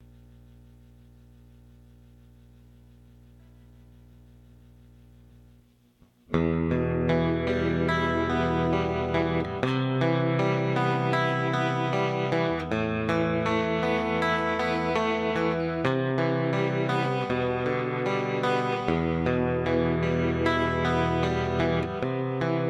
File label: hexaphonic; ken lusk